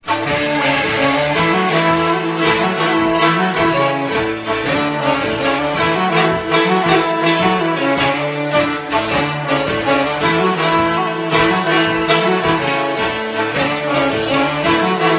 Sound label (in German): marsch